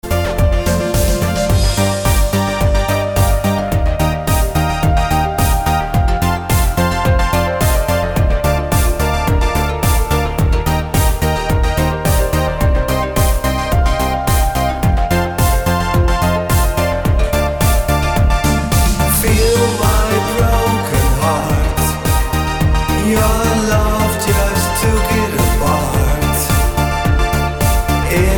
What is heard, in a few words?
classic short eighties mix